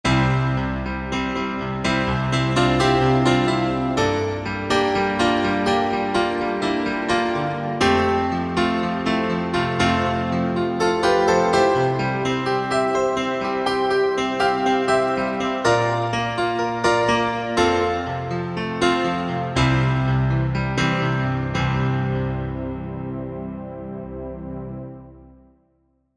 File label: Piano Stack; Sonic Reality Modern Songwriter